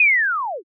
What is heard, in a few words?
whoosh down